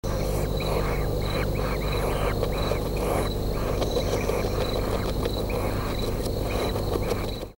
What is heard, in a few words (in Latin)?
tyto longimembris